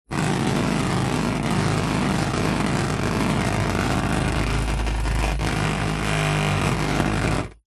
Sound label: metal vibrate vibrating object on metal surface; foley; zapsplat